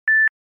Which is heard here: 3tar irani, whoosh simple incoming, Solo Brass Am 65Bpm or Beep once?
Beep once